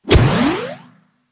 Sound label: scatter Hit; hotline